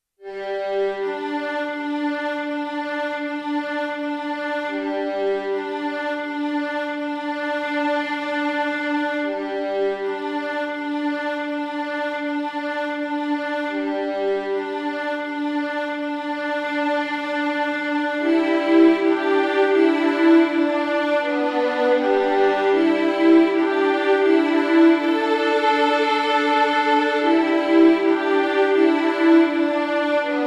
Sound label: Violin Fun